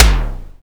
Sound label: SDS5 KICK F; Greytsounds VOL-1; SDX KICKS